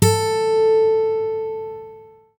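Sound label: GUI Ac. A2, acoustic guitar; Best Service Gigapack 2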